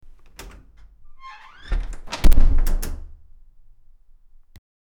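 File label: door opening and closing; scp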